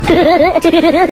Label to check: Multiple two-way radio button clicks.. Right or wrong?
Wrong